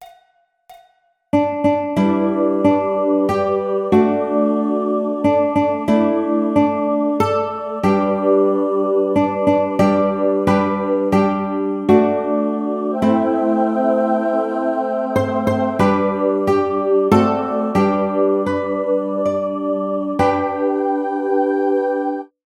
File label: Ukulele; Happy Birthday